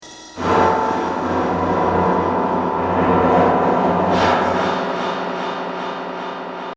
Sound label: prison noise